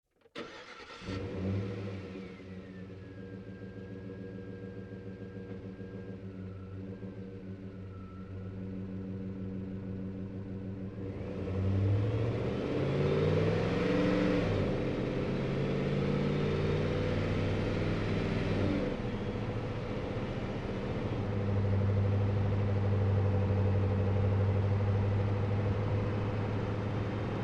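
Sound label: Truck GMC Savana Onboard Drive Accel Decel Medium; Trucks; Big Room Sound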